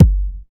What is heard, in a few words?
kick g key; drum single hits one shots